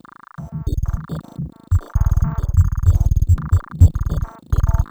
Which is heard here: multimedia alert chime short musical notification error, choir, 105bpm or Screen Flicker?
105bpm